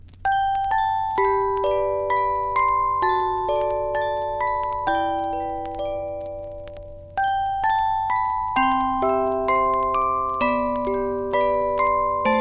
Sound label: Lullaby